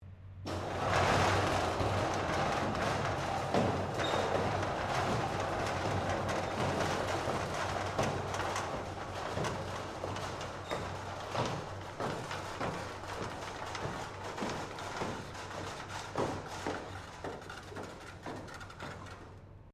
Door Roller Door Docking Bay Opening Stereo, Medium size loading bay roller door; Miscellaneous Doors